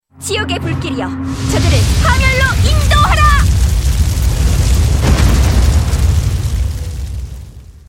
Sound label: Fiery Vortex